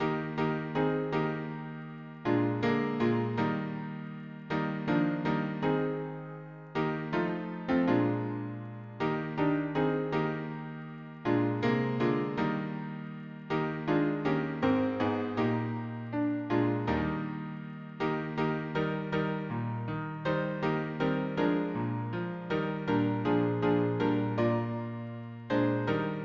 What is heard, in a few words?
harmony piano